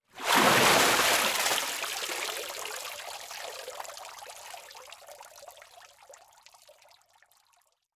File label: Liquid Water Water Splash